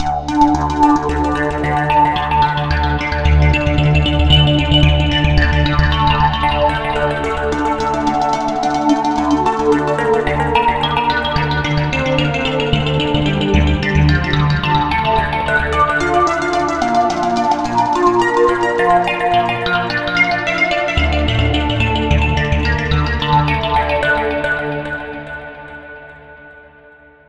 arp

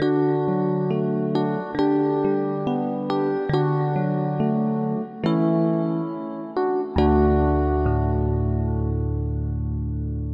rhodes

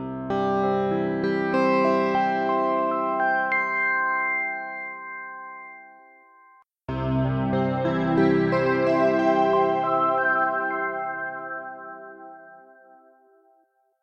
noefx synth piano

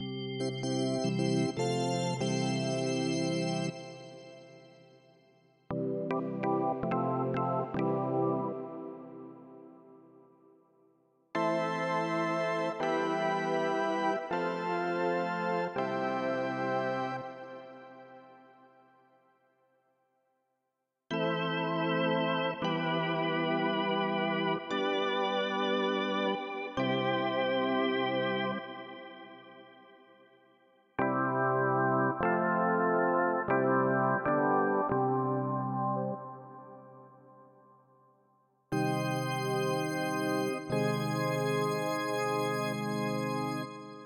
organs

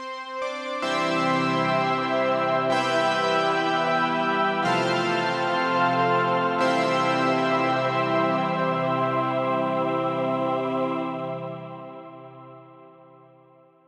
piano saw choir, PADsynth

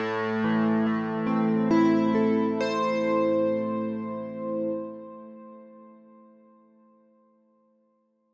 synth piano, PADsynth

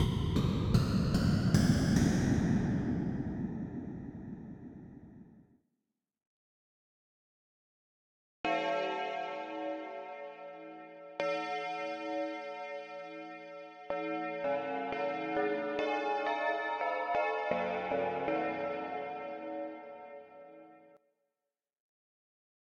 noises

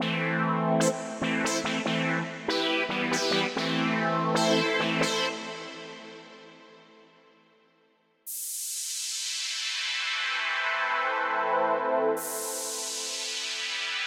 pads

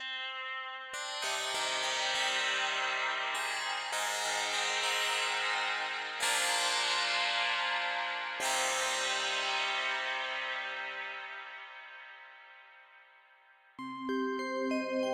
synth pianos

